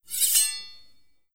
Unsheathe; Legend of Keepers (gamerip) (2019)

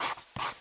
pen

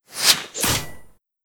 Logo

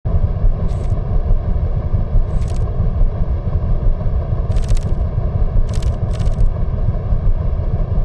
Walk; starbites

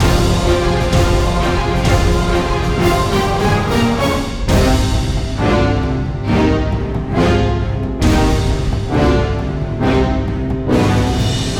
Theme Pirate

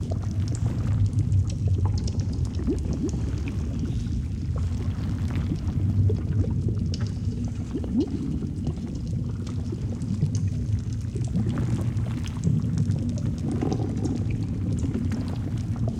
Cave Loop

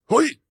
Sentry Fat Attack

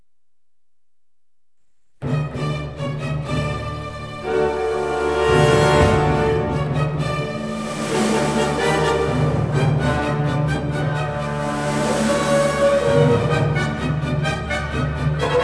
Fail; Hidden & Dangerous

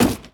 Drone; rabbids multiverse; iOS, macOS, Mobile

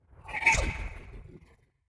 Baloon Idle

